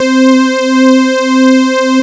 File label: Proteus Lead C6